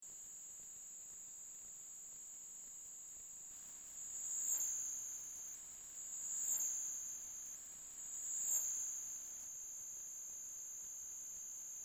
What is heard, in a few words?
laser